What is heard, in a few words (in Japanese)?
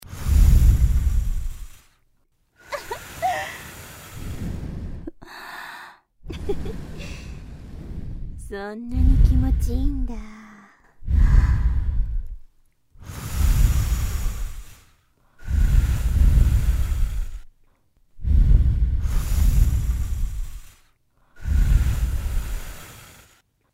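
立体音響フーフー音声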